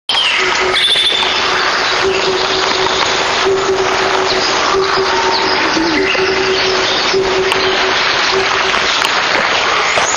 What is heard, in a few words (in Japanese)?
ツツドリ; 戸隠